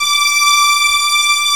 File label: VIOL G4, VIOLINS; AKAI S6000 CD-ROM - Volume 1; Strings